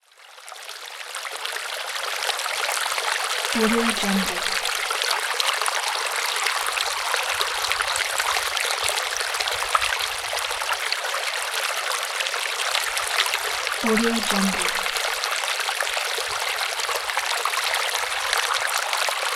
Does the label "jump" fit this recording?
No